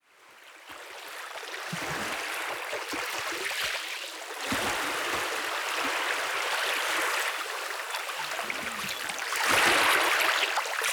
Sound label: Ocean Waves; Ocean Sounds for Sleep and Meditation